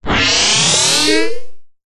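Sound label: science fiction cyberpunk robot surge power short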